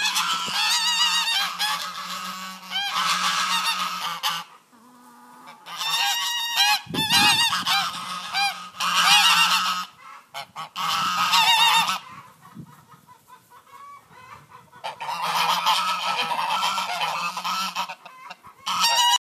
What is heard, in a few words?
goose alert